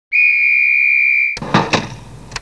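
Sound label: Door Close, Train; MDmot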